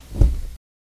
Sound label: Cloth Rustle Sngl Dr